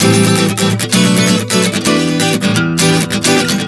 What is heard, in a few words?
Em7-Am7-Bm7 130 bpm, acoustic guitar chord progressions